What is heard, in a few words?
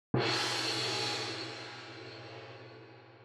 big Win Start; netent